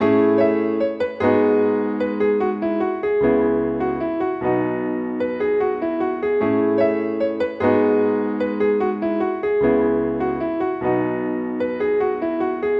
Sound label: Piano Loop - 150 BPM C# Min; Piano Loops; Future Bass; Instruments; Scarlet